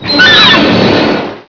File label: bump; quake2; alien